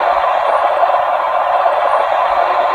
spinner Empty Spin; unsighted

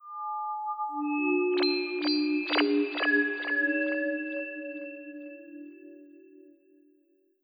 Monster Altar

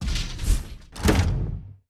Defense; starbites; Badger

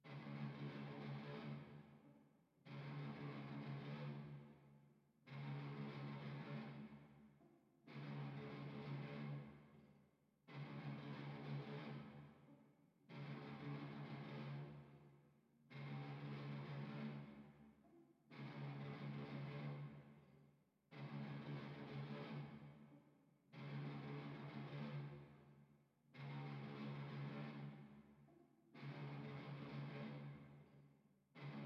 GTR Verb

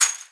Darts; Sfx Darts

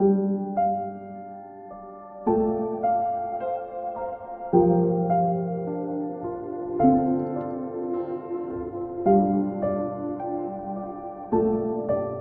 Train Song 106bpm

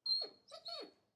Dog Sad; unsighted

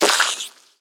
Flycaller Damage

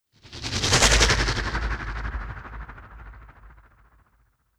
Stone Cyclone; Necro

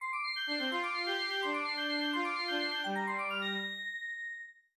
Station Jingles

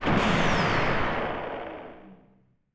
Ship Explode

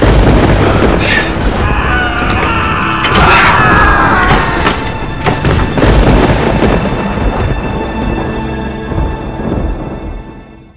Gameover; Movie - Gameover; Castle Knatterfels Curse of the Zombie Krauts

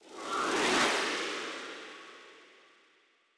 Harpoon Skill

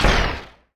Small Rocket

